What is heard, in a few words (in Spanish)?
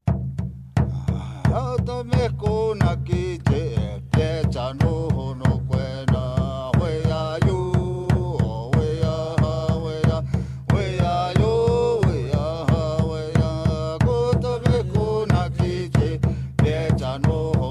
kikapu sin nombre canto del juego de tehuas